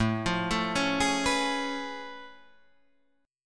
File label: A Augmented Major 9th A down Guitar Open D